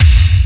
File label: PPC Explosion